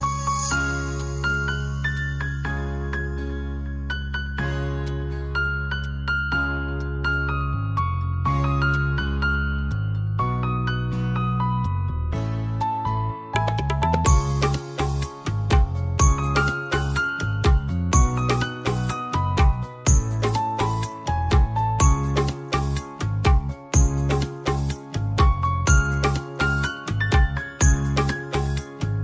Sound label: Instrumental Ringtone